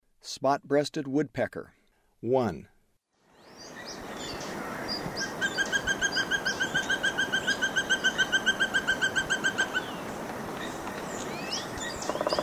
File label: Spot-breasted Woodpecker; S. America; Eastern Lowlands